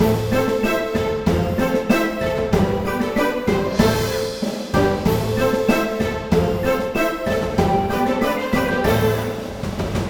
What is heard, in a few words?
Draw Lose BGM; Cookie Wars; Jelly War